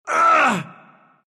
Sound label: pain; dota2